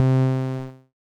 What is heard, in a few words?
DEEP HOUSE BASS